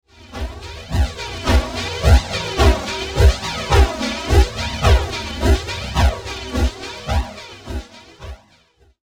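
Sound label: Musical Element, Drum Snare March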